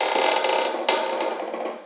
creak

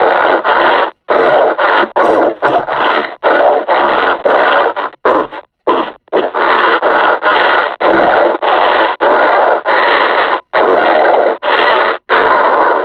breathing, combine suppressor

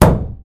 drywall impact hard, plaster; physics

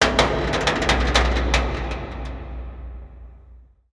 stuck creak, crane; Half Life Alyx; chasm

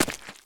mud, footsteps; player

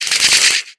attack tell, antlion; creatures; vox

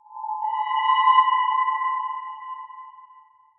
ambient abstract metal evo; Half Life Model Pack

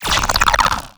bolt huge zap

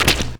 fs fm paint, footsteps; Portal 2

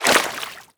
water entry

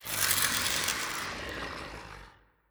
zombie scratch, brickwall

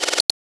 combine mine deploy; Half Life 2

